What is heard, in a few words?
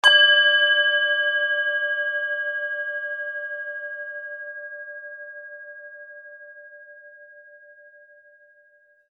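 tibetan bowl saturnset, D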